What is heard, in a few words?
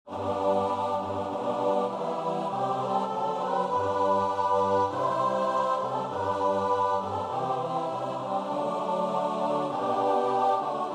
choir; mo ghile mear